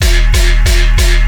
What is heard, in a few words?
gun attempt